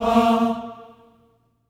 Staccato M A#3 L, Symphony of Voices; Spectrasonics

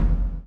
CHAIN; Spectrasonics - Backbeat

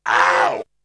Vocal; ZERO G - Datafile DISC 3

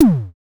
DSM2 Tom; MPC DSM-2

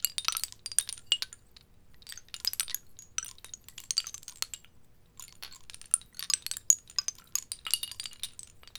Abstract Shell Loop

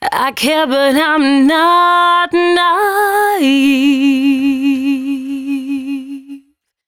113bpm C#, Velvet Vocal; Zero-G

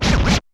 REV SCRATC; Back In Time Records; Big Bang

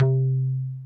BASS C3, Yamaha VP-1, SYNTHBASS; Music Store

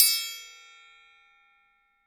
Cajun Triangle Ring; Sonic Foundry (Sony Creative Software); Joe Vitale Latin Percussion

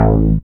BAS, Eurotech; Sounds Good

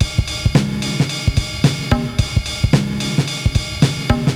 80s Gated Verb Korg MR-16 (110BPM), cyborg beats

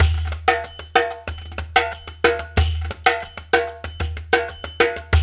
93 DUM, Dumbek Belly; Spectrasonics; Supreme Beats; African Contemporary

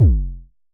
Kicks F1; Vermona Kick Lancet; LookIMadeAThing Kicks; Festival Kicks; Drums

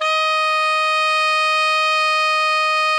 ALTO MF D#4, Sax; Greytsounds; Philip Wolfe David Crozier's Saxophones

